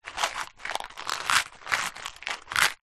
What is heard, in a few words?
box card small safety pins movement lid on; household